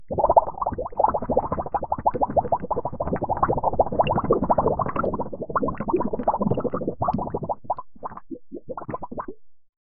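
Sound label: bubbling sound of an object, bubbles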